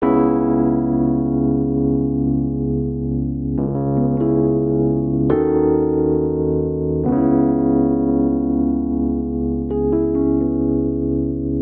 Progression Melancholic Minor Db Minor - Eb Minor7b5 68 bpm, Electric Keyboards; Ableton; Factory Packs